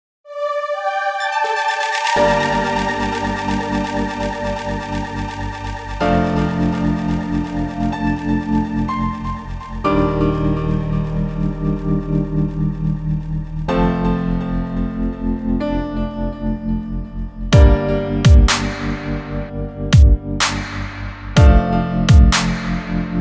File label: Instrumental Remix